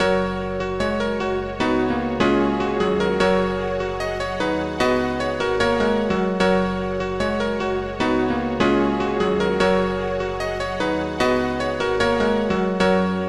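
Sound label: piano song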